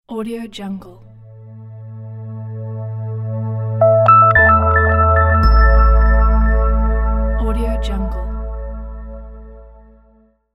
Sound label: business logo